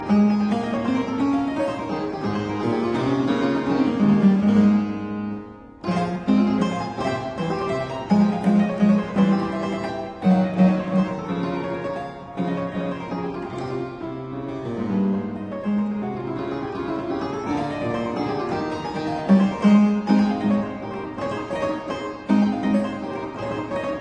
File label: Keyboard Sonata in G minor; Baptista